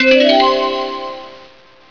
Bell Cmaj9

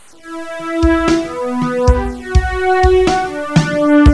cello ch

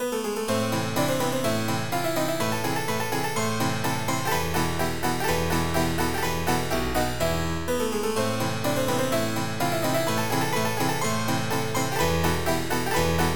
turkish

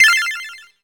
chords, Synth

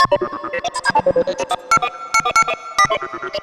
synth; widdagroovebox

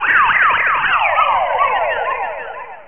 ALARM; JewelPro 5.0.0

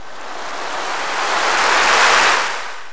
seashore; GMPlay

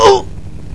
scream; PC Gamer 5.6

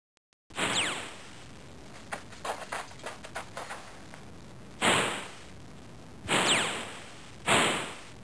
Cowboys Gun Fight Duel; Soundsation; Weapons

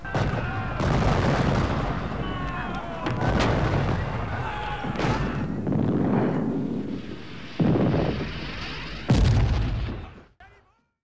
Battle; Waterloo

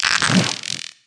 winged scarab hit

